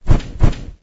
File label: Shake hand